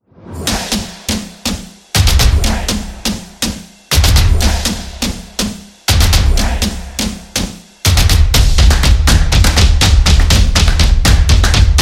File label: Claps Drums Energy; percussive nation vol 6